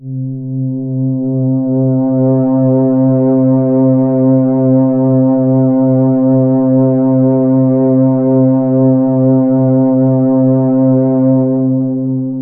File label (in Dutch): Glijden lang, FM; Polyend